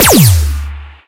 squad lasers; darwinia

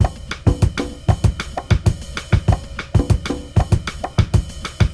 Drum Bombo Groove

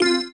bleep; fight

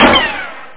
RICOCHET

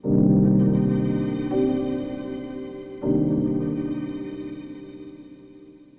synth pad ambient 160 C; Sphere

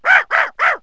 small dog; animals; Wired for Sound Pro CD; Aristosoft, Inc.

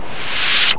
DOOR CLOS; Graliens; PC Gamer 47

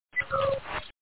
beep

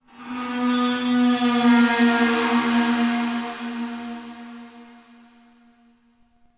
ice; DAoC

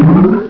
doink

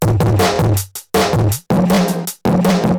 drum loop D+B 160; Drum+Bass

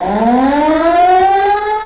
ALERT

